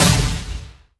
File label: hit left; junisaber